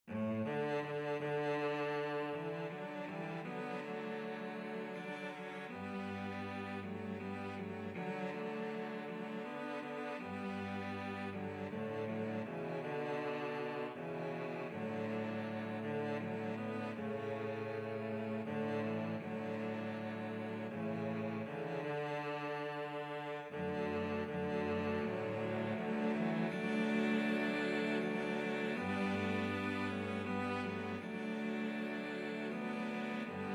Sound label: cello quartet